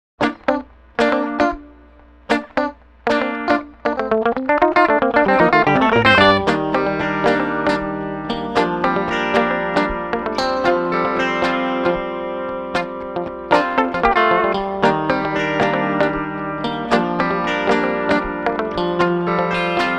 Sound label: Funky Direct Guitar; sessionguitar; Session Guitarist